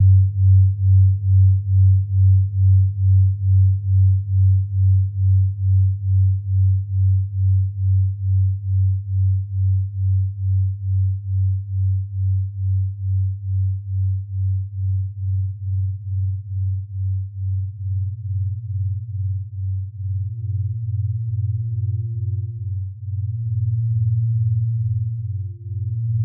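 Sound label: Contemporary G Major Quarter Tone Set Fundamental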